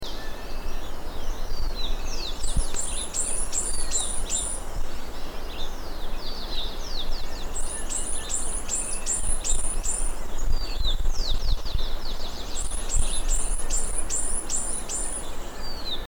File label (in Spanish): saira antifaz, Pipraeidea melanonota, Fawn-breasted Tanager; Thraupide